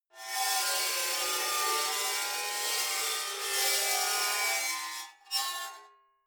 metal scrape rich straight

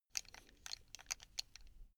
buckle rattle quick

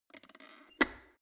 plastic water boiler close lid

